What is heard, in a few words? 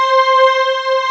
DETUNE WAVE; AKAI S-Series CD-ROM Sound Library VOL-8; S-PAD